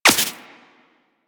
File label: Laser Impact Flesh; Warframe; Weapons